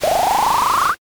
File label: pour